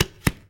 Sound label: TIRA, TABLAS